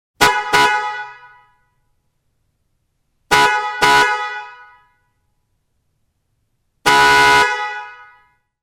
Car Horn; Soundzabound Volume 3

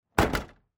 Car Trunk Open

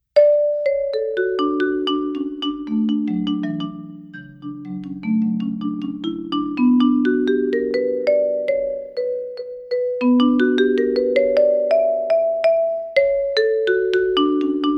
Marimba Solo

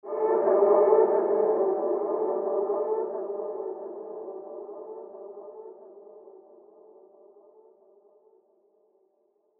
creature howl

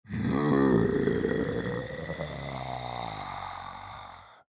human growl

